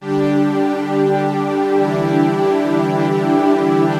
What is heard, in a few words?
Synt; FUNKIN&GROOVIN'